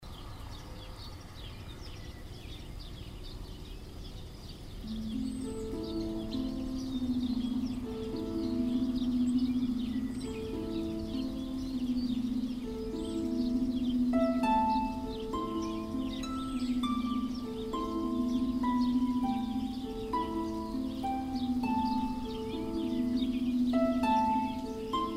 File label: rain